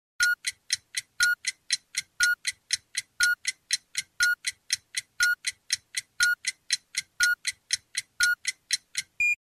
timer 10 s oneplus

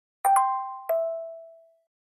galaxy watch music box

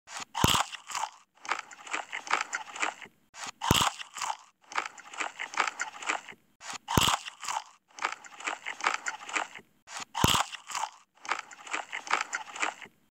pop

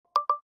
ios18 app clip prompt; Системные звуки и уведомления iOS 18 (iPhone 16, 16pro, iPad)

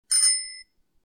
bike bell

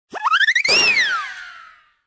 cartoon take off

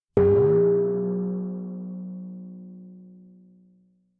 Tympani Bing

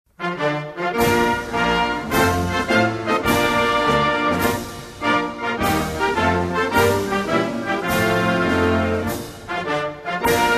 anthem

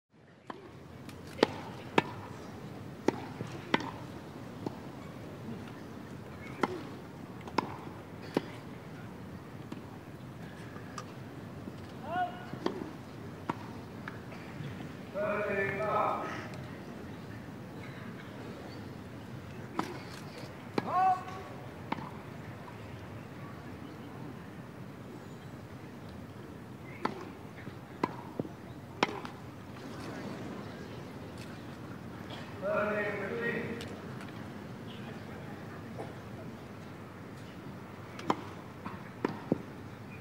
tennis

